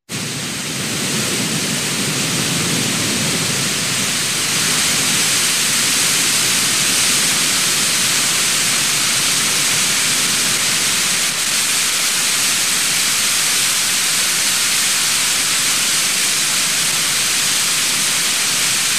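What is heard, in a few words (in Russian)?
звук струи пара продолжительно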